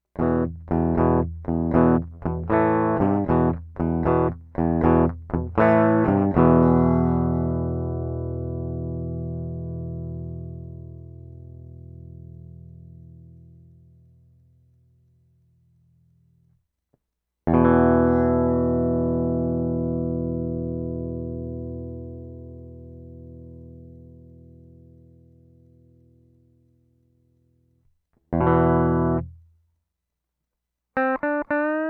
Clean Test Sample

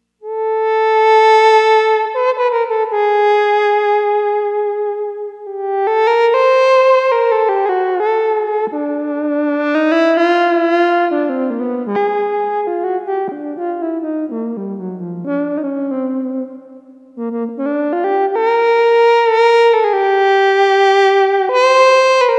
Nord Alto Sax; wind sounds

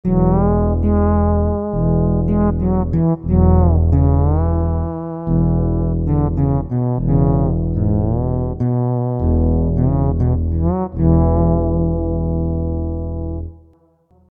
Sound Canvas Fretless, Continuum

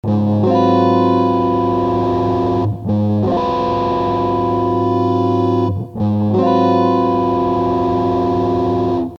Pedal Fade, guitar sounds

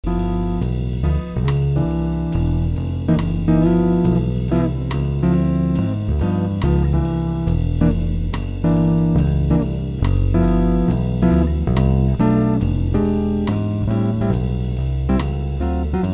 Three Note Accomp, stick, Blues Noodling; Accompaniment to play behind a soloist, 3- and 4-note voicings over walking bass; Chapman Stick

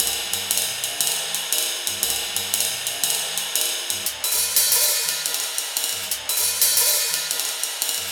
4 Bar Loop, jazz pedalboard; RC-20s

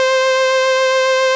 ARP Chorus Leg, arp strings; loopable

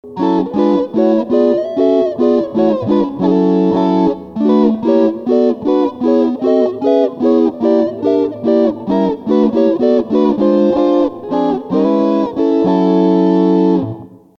Hex Block, guitar sounds

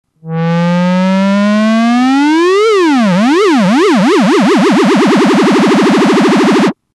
Fast LFO; arpaxxe

